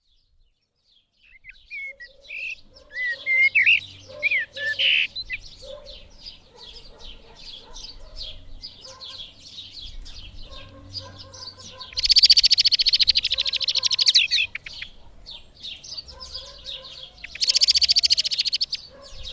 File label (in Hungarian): Feketerigó; Madardalok